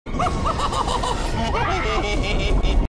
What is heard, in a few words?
IT Laugh